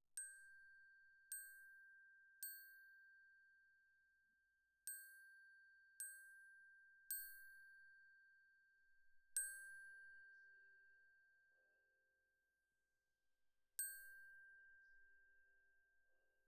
Glock Close

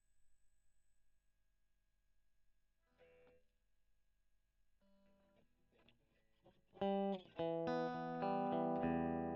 E. GTR Gefell

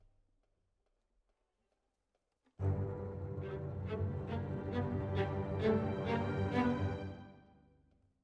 Violas, jazz for piano

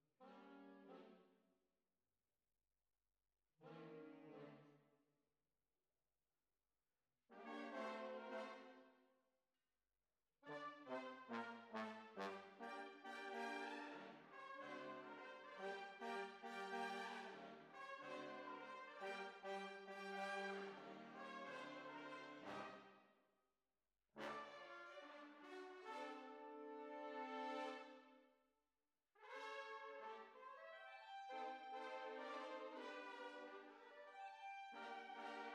Tuba, jazz for piano

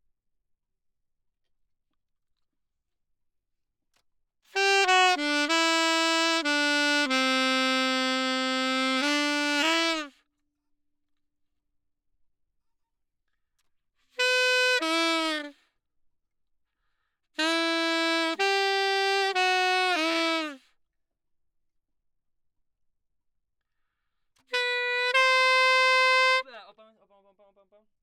Sax; Gefell

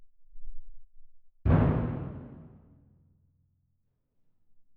Twin R Perc

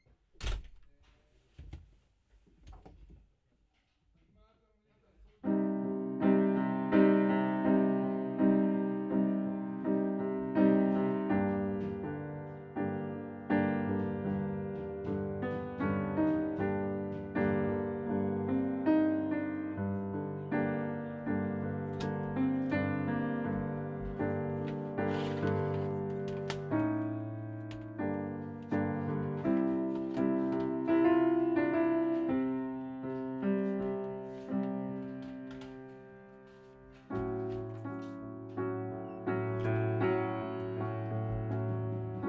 Piano Low

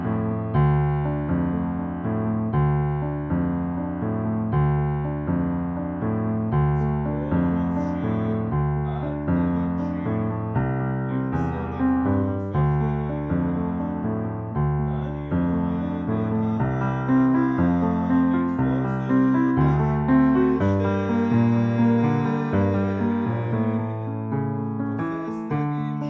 Piano R

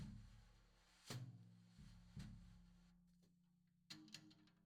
tom

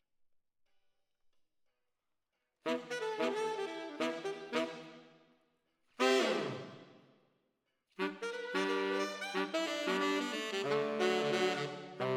ORTF SCHOEPS, piano

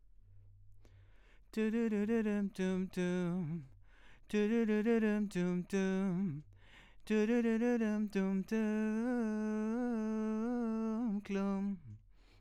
VOX

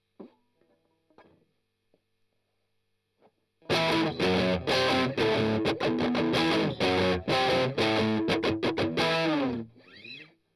Strum Octav

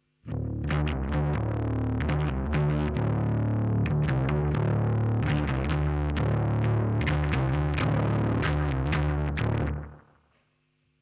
Bass DI

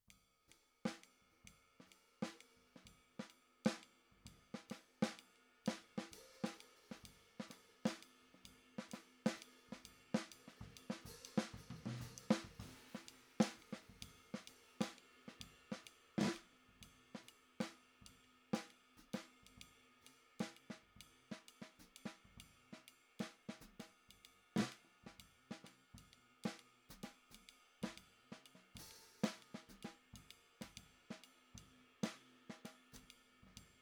SNR

